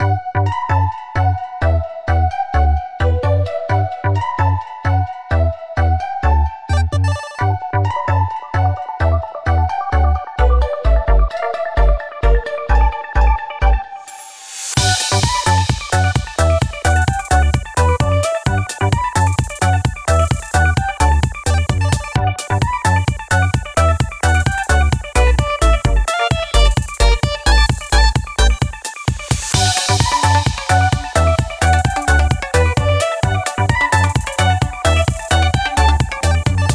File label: CHEERFUL STEPS; Flip Flop